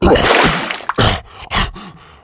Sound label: gasp